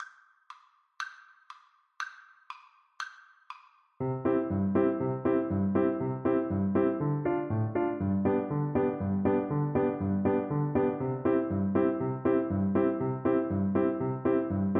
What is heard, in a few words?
boda gammalgarden FL kar, Flute